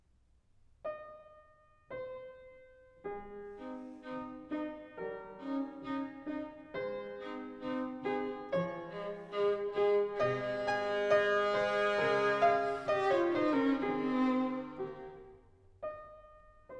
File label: Sonata for violin & piano in E flat major, Adagio; Mozart